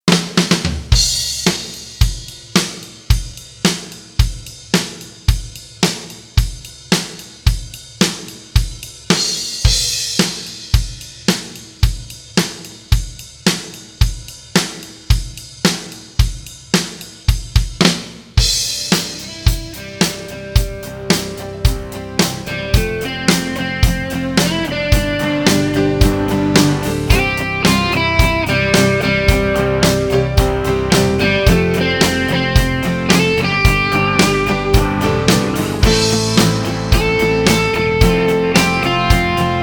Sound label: pop